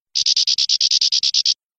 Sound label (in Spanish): pedorreta; campero